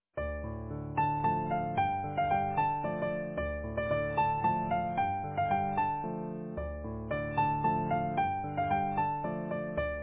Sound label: Easy Piano